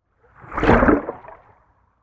Underwater Pass By, Water, Underwater, Movement, Pass, By, Hydrophone; Water Sound Effects; BlastWave